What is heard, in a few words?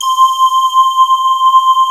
STEAM C5, D50; E-MU Producer Series Vol. 2 – More Studio Essentials; Keyboards; D50 Combo